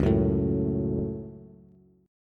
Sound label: C sharp Suspended 4th Sharp 5th C sharp down Bass